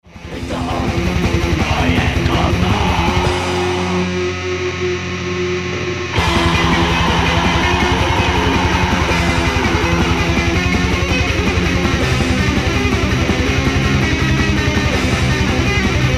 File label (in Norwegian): Sverddans